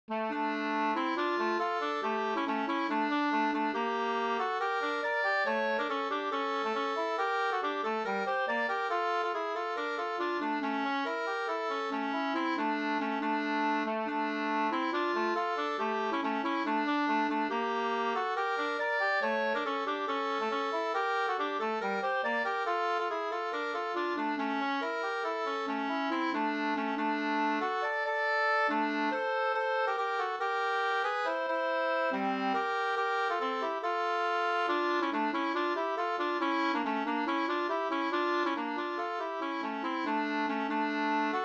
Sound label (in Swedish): humppa